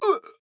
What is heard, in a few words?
Grunt Hit; paladins; MalDamba